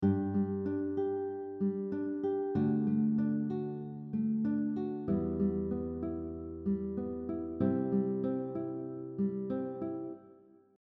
Fingerpicking pattern 8 four fingers on a beat, guitar; we'll also break up the notes of each chord to create a flowing effect